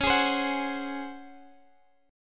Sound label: C sharp Major 7th Suspended 4th C sharp Guitar Standard; strummed; capo 9